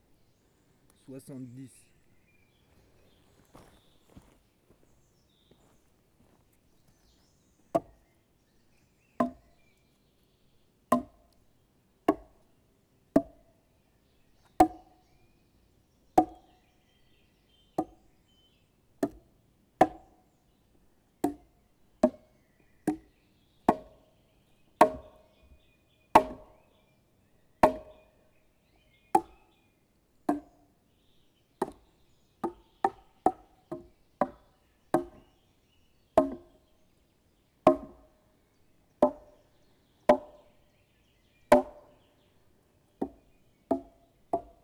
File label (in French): Gourdin Buches séries multiples; Percu Bois